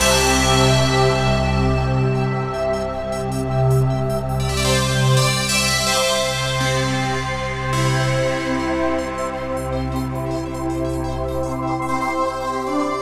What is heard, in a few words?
Signature Sound; FXHome; Signature Tracks